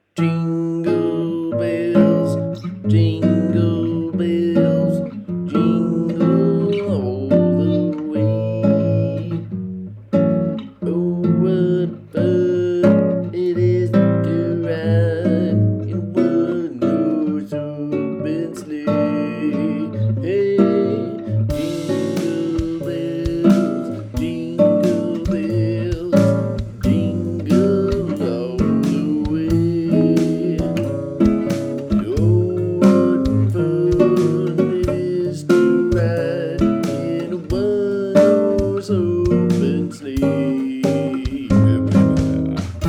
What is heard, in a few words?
jingle bells mix; jingle bells squared mix